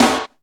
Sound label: dark snare b key; snare drum samples